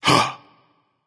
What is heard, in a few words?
grunt hit, voicepack; smite; vamana